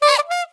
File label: Hit Clown, Damage; Barotrauma